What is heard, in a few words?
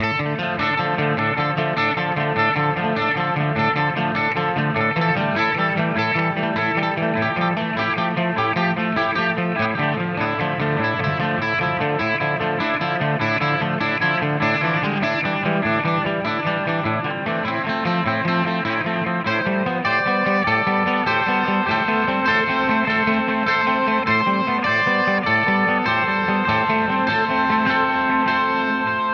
Headrush MX5